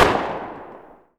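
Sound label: exterior shotgun shot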